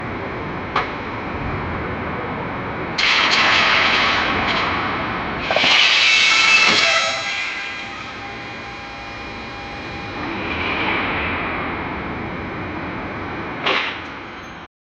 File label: car crash in to building; accidents